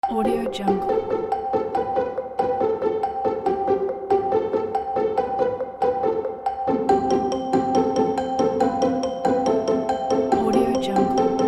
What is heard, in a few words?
quirky casual background; monoalex